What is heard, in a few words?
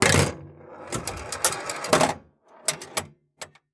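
Drain, pinball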